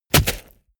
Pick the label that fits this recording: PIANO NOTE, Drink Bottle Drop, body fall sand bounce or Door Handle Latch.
body fall sand bounce